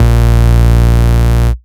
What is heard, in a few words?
Root A#, Ultimate Bass; Vengeance Samples